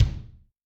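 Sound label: bass drum, Kick, Yamaha DTXpress-II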